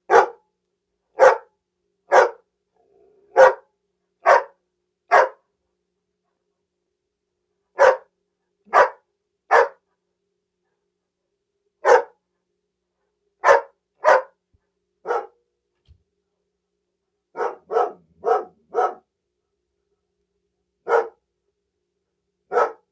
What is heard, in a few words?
Lrg Dog Ba